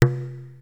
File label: Access Virus B; Synthworx